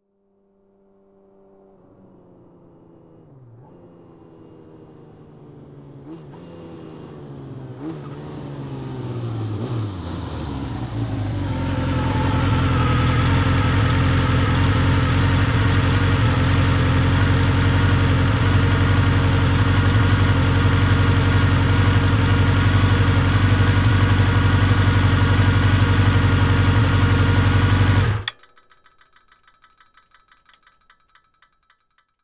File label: Motorcycle Modern Pull Up